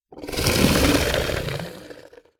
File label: snarl